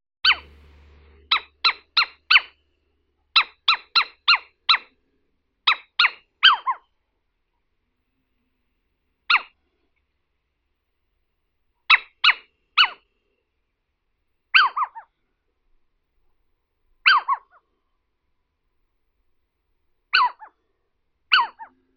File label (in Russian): sich domovii athene noctua signali trevogi, zvuki prirodi